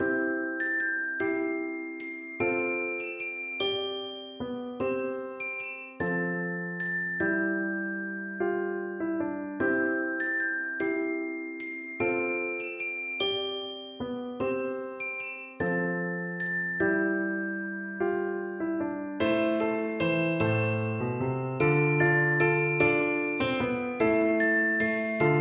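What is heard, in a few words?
skye boat, xylophone; percussion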